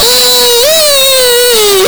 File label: Death Spider; Miner Max